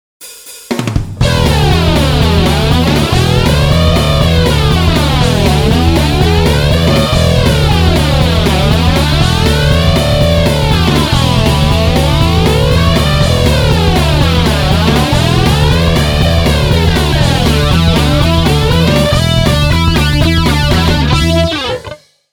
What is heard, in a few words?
patch demo